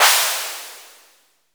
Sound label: SHOT, DRUMS; Music Store Sound Service; Einstein